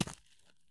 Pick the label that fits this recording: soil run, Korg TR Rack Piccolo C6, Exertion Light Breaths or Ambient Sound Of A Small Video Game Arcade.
soil run